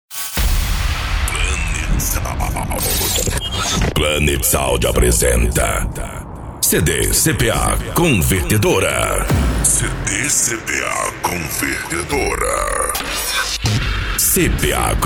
sertanejo e forro; cuiaba mt; planet sound e cpa convertedora

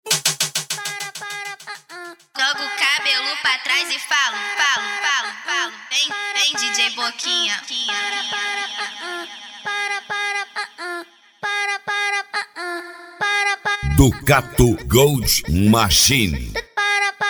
especial funk

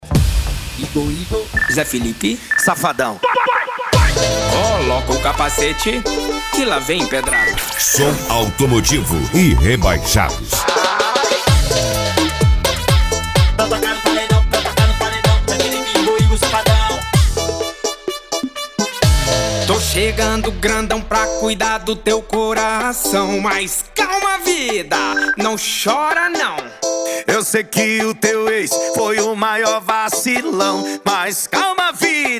som automotivo; rebaixados

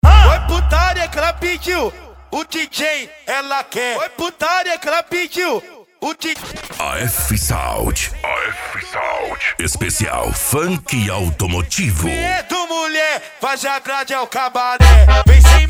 esp funk automotivo; afsound

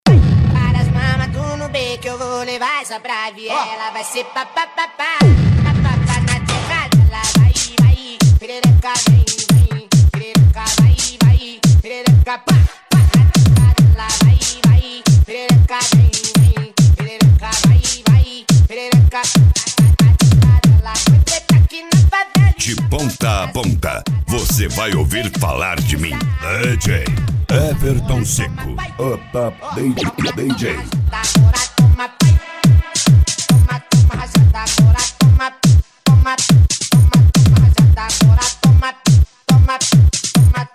som automotivo

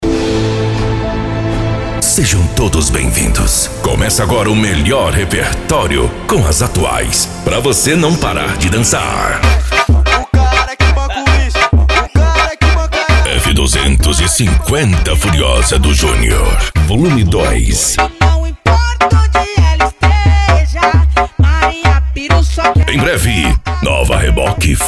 funk e sertanejo

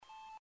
dance e funk retro; branco som

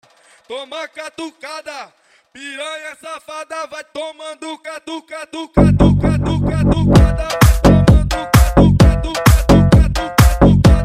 mega funk

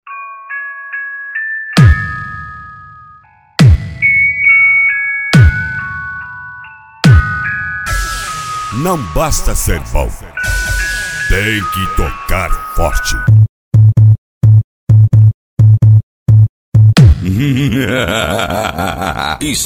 funk bass sertanejo

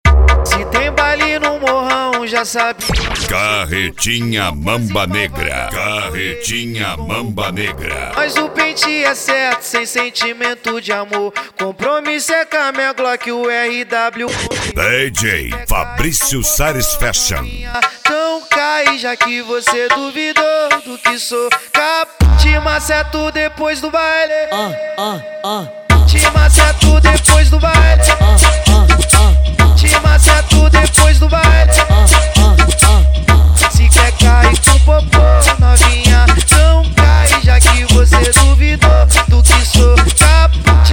carretinha mamba negra esp funk ritmado; jr auto som

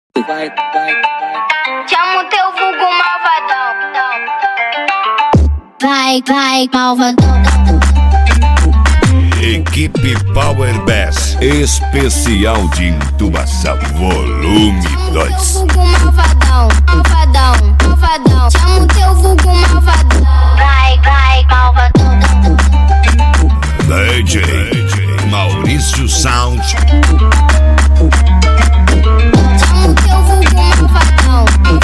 entubacao

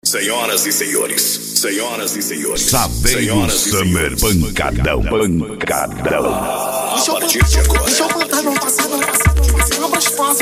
som automotivo; elite car

